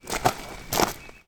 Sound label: Footsteps On Gravel